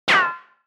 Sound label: retro game shot